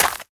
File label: run gravel; lucius